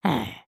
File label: Idle Look Up; Lego Strombie